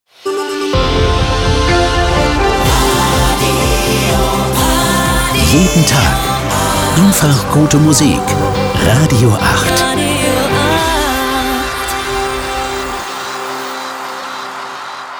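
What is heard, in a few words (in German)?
Transition Fast Dance to med aktuell; RADIO 8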